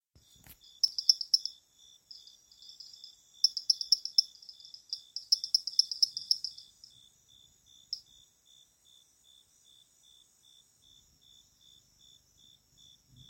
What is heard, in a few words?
Dendropsophus minusculus